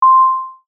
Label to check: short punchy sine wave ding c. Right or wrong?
Right